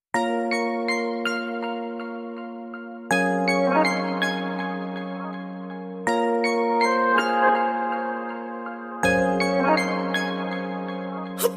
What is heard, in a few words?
Hindi Music Ringtone; ringtones